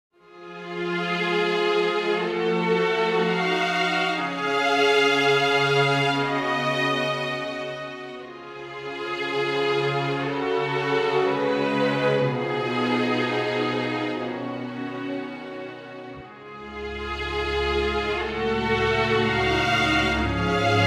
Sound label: strings; 時は中世、教会の祈りの場にて。; 遺された史歴を元に、古代音律の復元を試みました